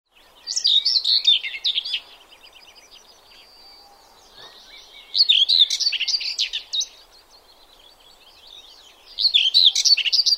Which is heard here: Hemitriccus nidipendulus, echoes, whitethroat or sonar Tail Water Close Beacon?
whitethroat